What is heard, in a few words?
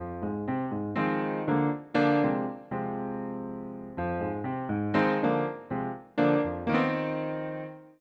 Pop Piano Chord